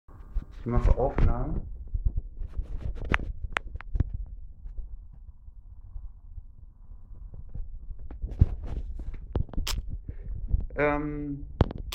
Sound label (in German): aufzug; hoelderlin